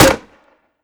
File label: weap fire; gmod; viper; mike203